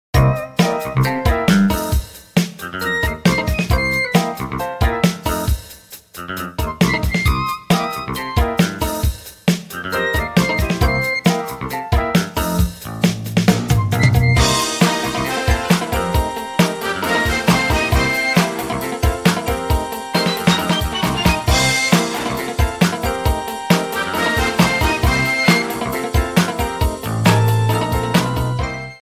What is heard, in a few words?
nokia ringtone; blue ice